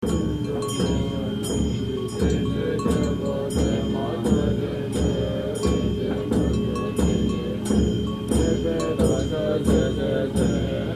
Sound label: Bhutan Loop